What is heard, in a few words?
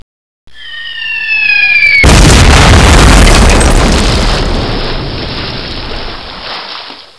boom splash